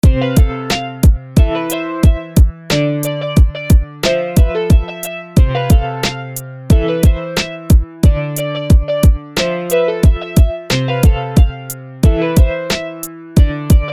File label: piano